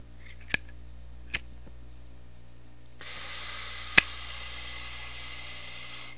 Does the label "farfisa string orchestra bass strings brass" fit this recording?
No